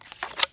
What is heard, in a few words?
bow take arrow; deathlegends